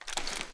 raise first start, reloads; gmod

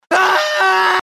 scream, male; gmod; npc

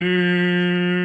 heavy horn; gmod; svmod

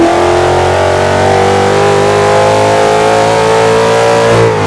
third, vehicles; gmod; tdmcars; zenvo

